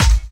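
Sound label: snare drum bass drum, Dance Mega Drums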